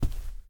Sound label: Step Carpet